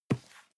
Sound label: cardboard box medium grab slide; objekte